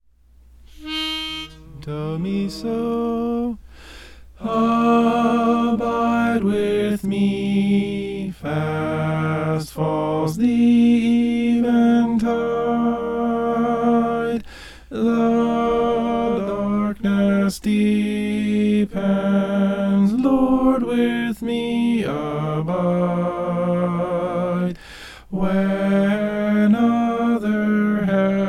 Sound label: tenor